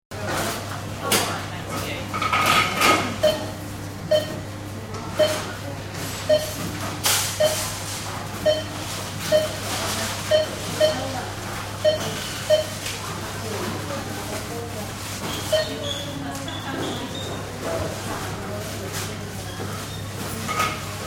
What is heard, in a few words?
Supermarket Checkout, Checking out in a supermarket store.; Stores and Malls; Backgrounds